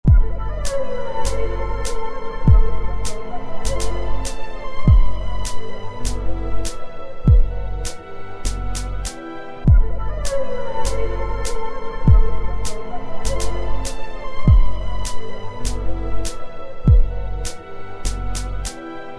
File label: minimal remix